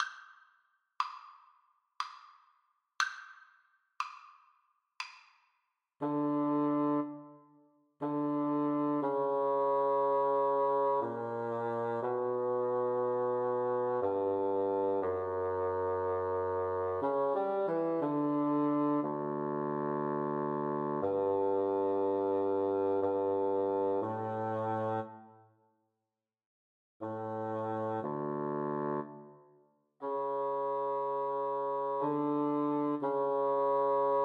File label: duos adagio, Bassoon Duet